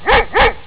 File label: MSN Chat dog